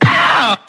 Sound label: slap mack, Stabs